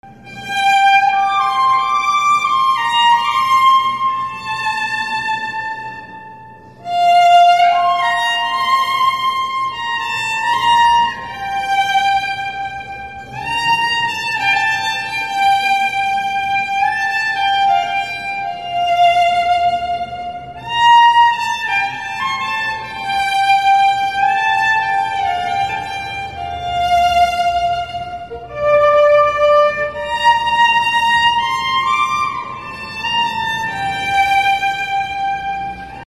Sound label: Theme Violin Instrumental Ringtone